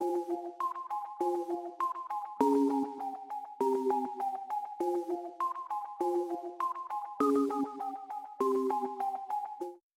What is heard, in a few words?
Synth Loop; 拉丁陷阱音乐